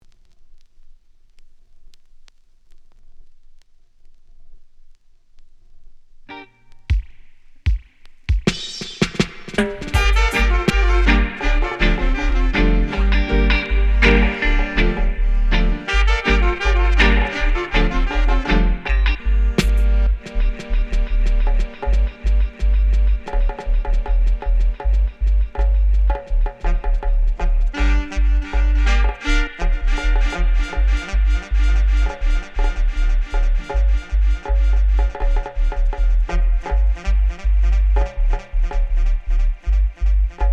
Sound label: Zulu dub